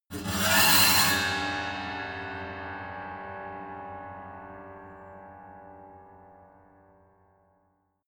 piano bow string broken high

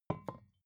vodka put down tipping

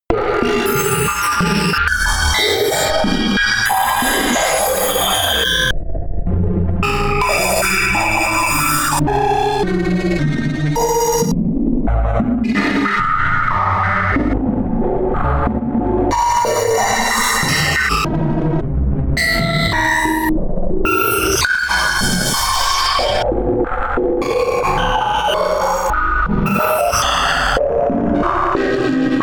atmosphere extreme erratic craziness high

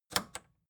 record player power click on slow

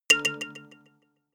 notification kalimba delay fifth

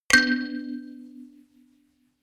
notification kalimba rattle third

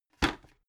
bucket put down hard

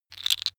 tiles wood

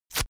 notepad sheet tear up fast

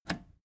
plastic button scratch smooth release disable

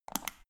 power button turn on off quick

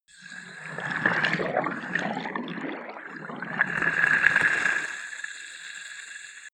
filling paddling pool

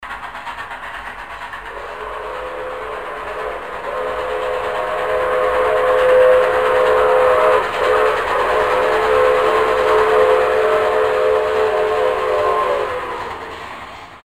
steam locomotive

